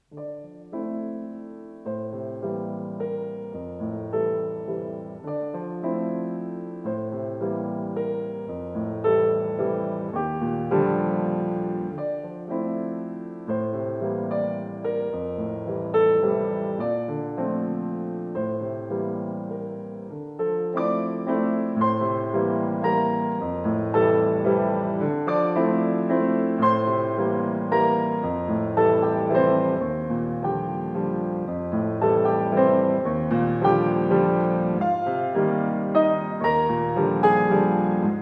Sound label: waltz